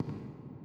footsteps generic

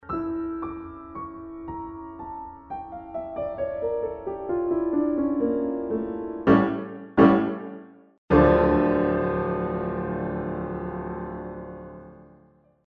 gameover

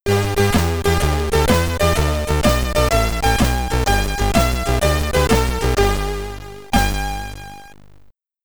Game Over, SID; Yogi Bear and Friends; Commodore 64; SID Stereo

